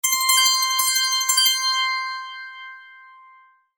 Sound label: ring; wake-up-tones